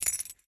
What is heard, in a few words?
jingle, metal keyfob; physics; props